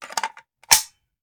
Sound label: Machine Gun Foley; Sound Ideas; Guns